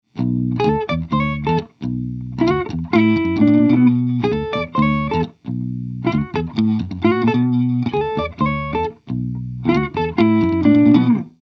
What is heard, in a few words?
Diamond Compressor; Diamond Krush